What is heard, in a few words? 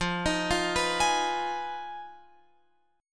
F Minor 7th Flat 5th, Guitar, Open F; Fm7b5; arpeggiated; x,12,15,12,14,15